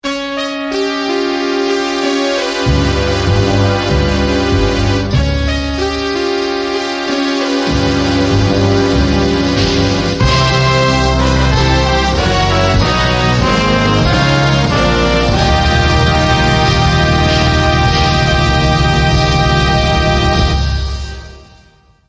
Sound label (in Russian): fanfary; Novgorod